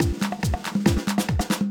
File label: ui User Match